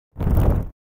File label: burn